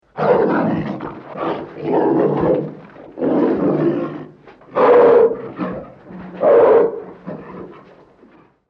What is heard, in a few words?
Tiger Siberian Growls; Big Cats; Animals; Big Cat Sound Effects